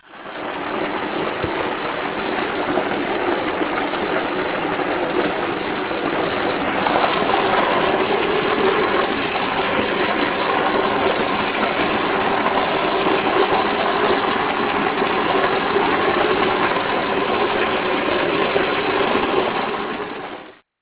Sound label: fall; Sensorium; kawasaki